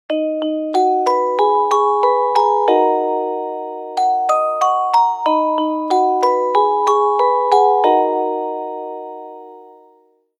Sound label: Light alarm, ringtones; Sony Ericsson T650